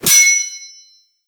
Sword Whoosh Hit Sword Hard Mono; S W O R D Whoosh Hit Sword Hard R R7 Mono; Legend of Keepers

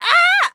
Enemy Generic Human Death Crushed

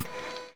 Panel Move-Down; Intro Vf-Pod

